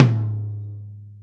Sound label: gretsch tom, deep house single hits